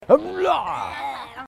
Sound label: hmmbleeeeh